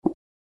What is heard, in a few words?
click; dealership